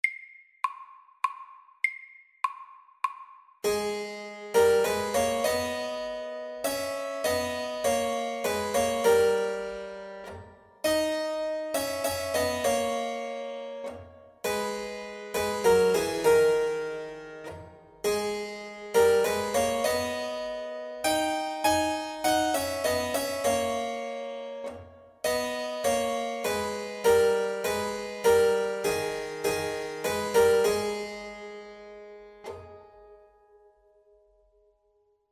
Metal minuet SAKTE

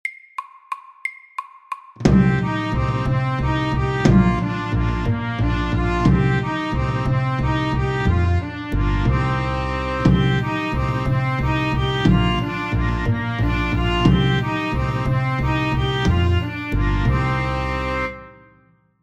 Sjanti FAST 180

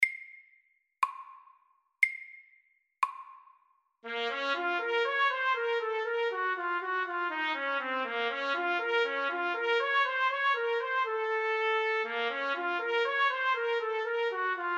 G minor etude SAKTE

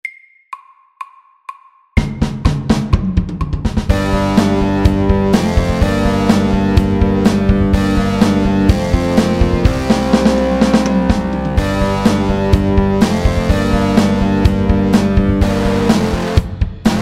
Heavy metal FORT

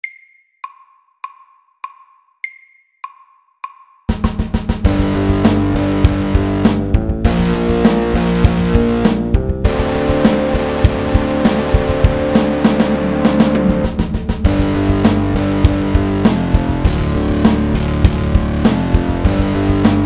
Pirate rock FORT

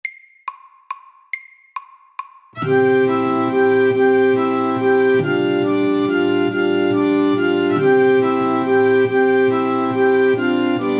Irsk melodi MEDIUM

